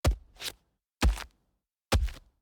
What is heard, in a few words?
baseball glove tags